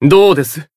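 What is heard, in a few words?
Voice attack, Japanese; shion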